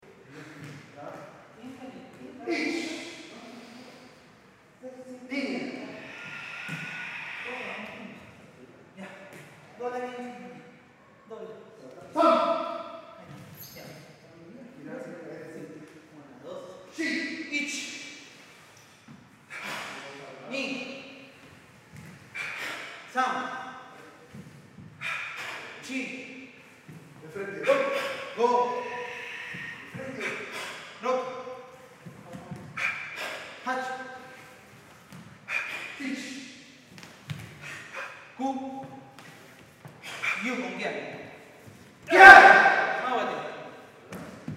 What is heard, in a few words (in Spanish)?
ENTRENAMIENTO DE KARATE INTERIOR